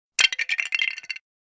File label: LEGO Breaking Sound Effect